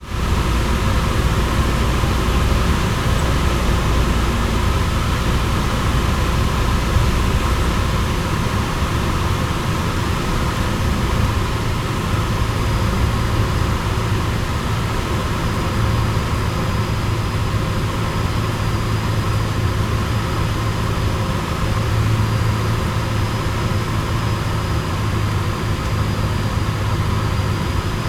Airplane Small Propeller Cessna172 Flying Landing Approach; Ambisonic; Sound Spark LLC